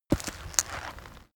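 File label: step gritty stone step, footsteps; schritte; menschen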